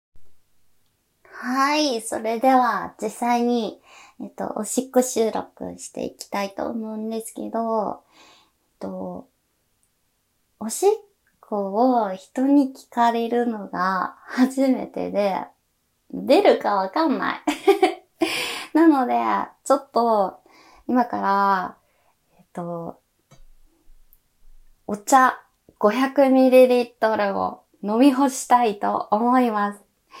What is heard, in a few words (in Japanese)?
おしっこオナニー実演